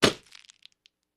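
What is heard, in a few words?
Flashbulb Pops With Glass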